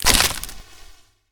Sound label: bush break